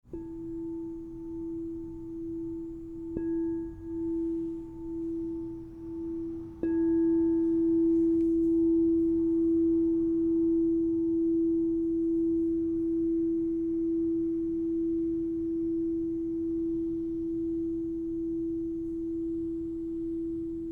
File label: Crystal Tones Singing Bowls